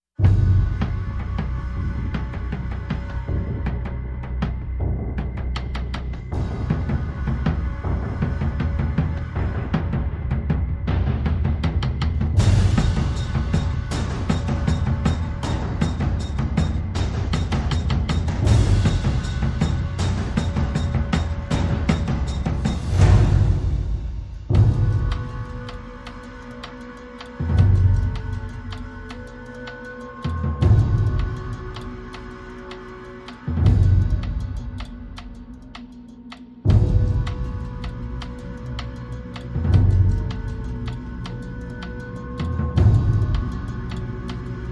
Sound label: Percussion and Pads